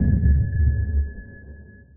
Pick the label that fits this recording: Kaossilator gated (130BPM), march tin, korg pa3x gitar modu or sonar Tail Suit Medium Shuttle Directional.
sonar Tail Suit Medium Shuttle Directional